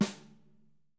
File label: YAMSNARE, Drum Kits; Sonic Reality